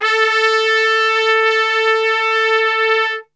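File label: A 2 piccolo trumpet, Brass; Best Service; Brass Super Section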